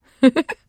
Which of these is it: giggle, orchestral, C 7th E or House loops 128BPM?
giggle